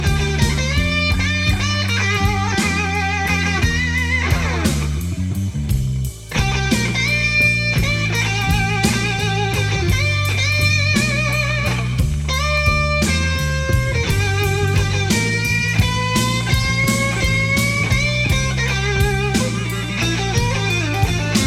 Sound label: solo in G